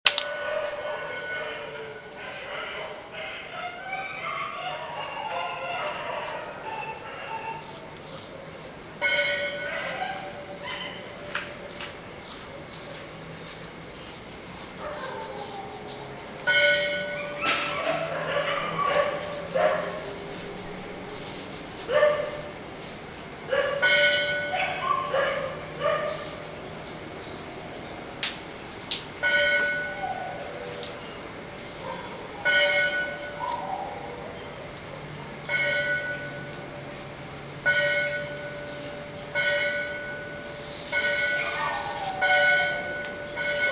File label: night dogs and bells